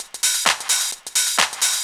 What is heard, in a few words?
drum 130 hihat, Techno Trance, Drumloops